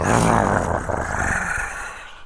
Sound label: Rap Vox